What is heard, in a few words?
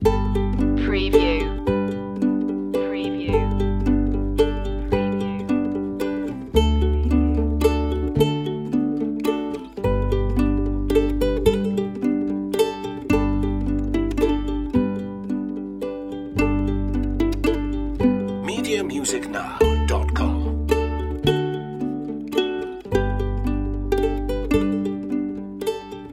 silent night ukulele loop